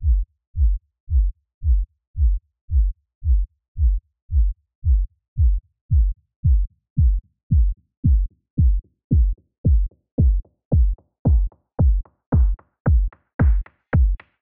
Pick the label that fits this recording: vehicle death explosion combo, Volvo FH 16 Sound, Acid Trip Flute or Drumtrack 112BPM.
Drumtrack 112BPM